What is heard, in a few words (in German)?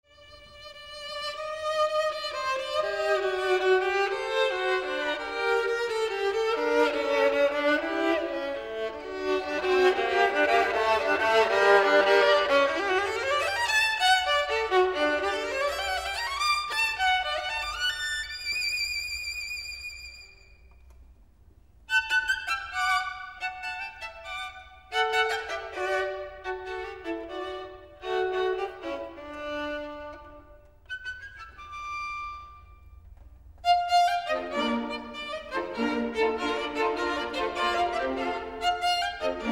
Kindergeige, Rondo; KV269; Lott